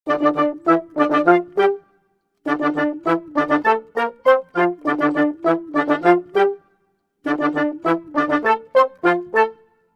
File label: 100 brasses+woodwinds, Orchestral arrangements, 100 D min